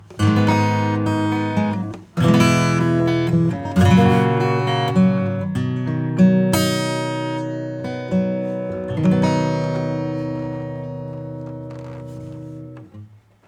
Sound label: acoustic eq; Aquamarine4